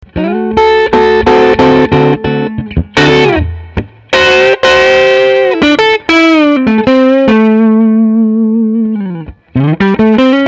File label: firebird pickup neck cleanest; humbucker pickups; Lollar; Jason Lollar